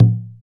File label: PER Percussion